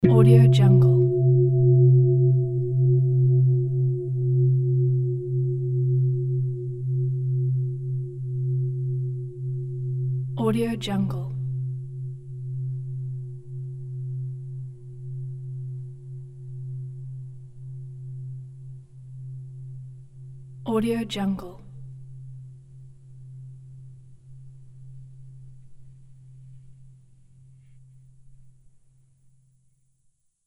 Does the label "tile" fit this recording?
No